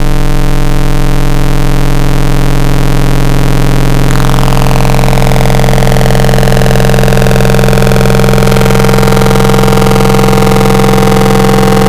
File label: Buzz, Wavetables; Future House; Make It Buzz; Cymatics; Serum